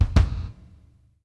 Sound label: DBL GATE, KICK DRUM; Sampleheads; New York City Drumworks VOL-1